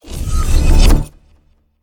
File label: pwr lg prefire; smite; god atlas